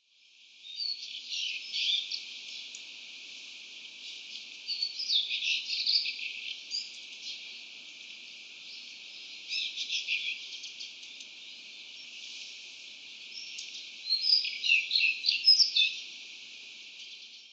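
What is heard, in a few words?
Daurian Redstart; jyoubitaki; utsunomiya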